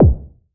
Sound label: BD, BASS DRUMS; EdgeSounds